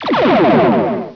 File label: Bridge Photons; Star Trek JR Audio